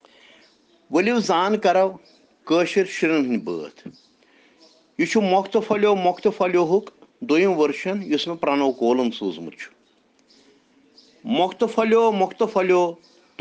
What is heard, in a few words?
Children Rhyms in Kashmiri